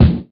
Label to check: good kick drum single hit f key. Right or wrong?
Right